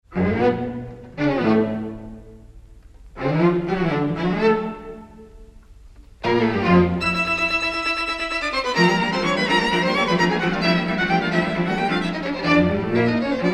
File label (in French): Quatuor à cordes; Finale Allegro vivace; BARTOK; n° 5; QUATUOR VEGH